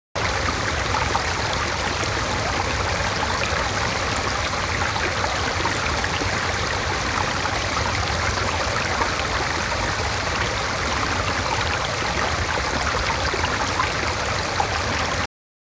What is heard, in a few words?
water stream large